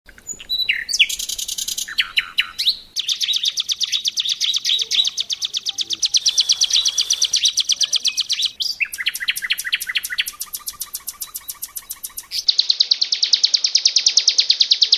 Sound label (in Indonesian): kombinasi cb nightingel